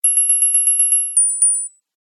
wake up tones; oringz pack nine

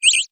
pedantic, message tones

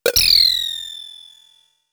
dropped spinner; funny

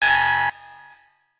attention required; sound effects

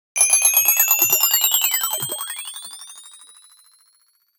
aliens stealing our clocks; standard ringtones

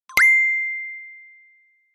swiftly, notification sounds

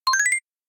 succeeded, message tone

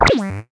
smack

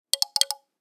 stirring drum; toys

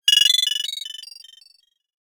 fly by; wake-up tones

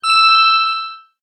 monotone; message tones

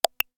pull out; notification sounds

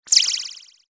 plastic bird, ringtones; standard ringtones

